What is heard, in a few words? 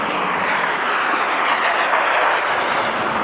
Shell Ambient